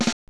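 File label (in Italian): rullante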